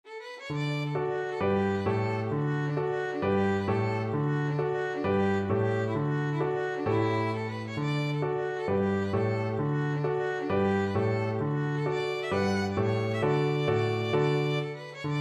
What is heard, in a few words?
hornpipe, violin